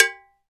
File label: Bongo Cowbell; Roland BK-5; Percussion